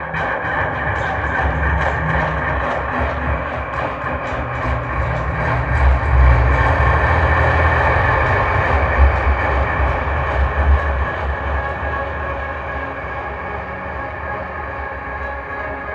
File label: Earthquake Guitar D#3; Zero-G - Ghost In The Machine